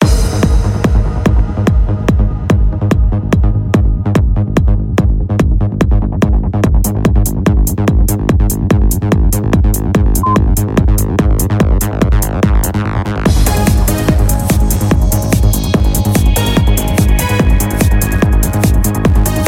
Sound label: Action Loop; Cubixx HD; PS3 Windows gamerip